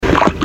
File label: water